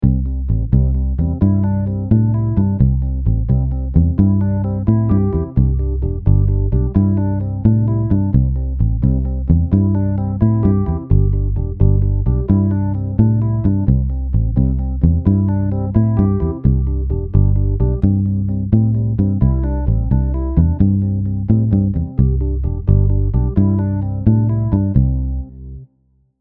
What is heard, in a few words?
No Melody, ukulele; Rainbow Ukulele